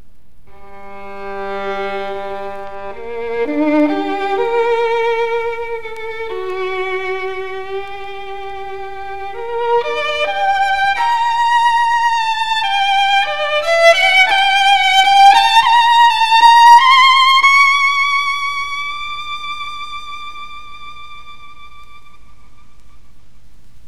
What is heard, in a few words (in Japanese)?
Guarneri, 擦弦; 弦鳴楽器